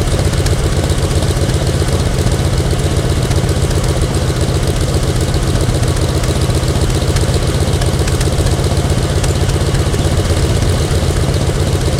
sherman m4a1 engine rpm 33 load, tanks; War Thunder

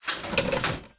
small caliber shoot mechs

